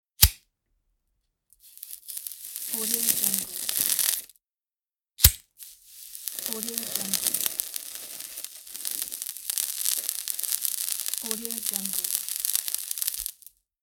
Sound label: smoking heroin with a foil